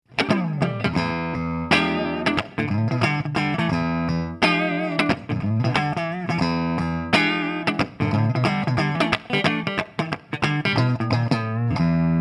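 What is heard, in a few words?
Wolfgang Standard Clean, guitar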